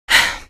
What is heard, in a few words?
exhale; vo; source engine; episodic; episode 1; citadel